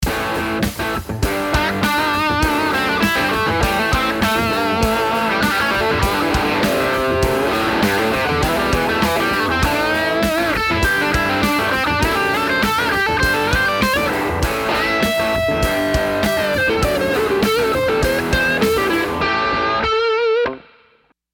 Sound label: JCM800; JCM800+GrinDr